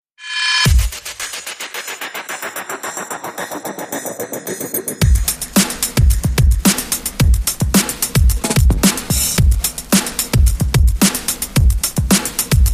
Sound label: Lullabyebye Beat